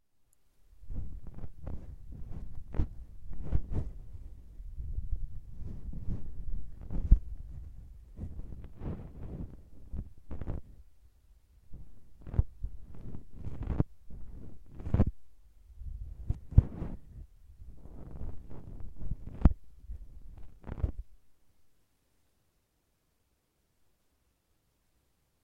優しい耳かき左耳; 甘々耳かき; 清楚で優しいお姉ちゃん

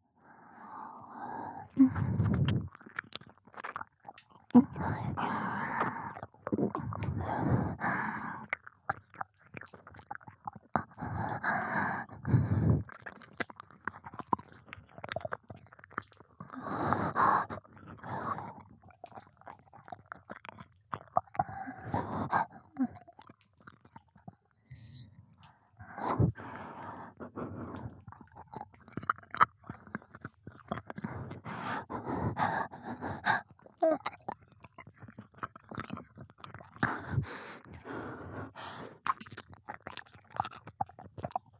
耳舐め素股, ASMR; アドリブ集

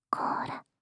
コーラ, ASMR; 無声音ASMR; シャッフル睡眠ランダム再生用; シャッフル睡眠法で眠ろう; バイノーラル音声

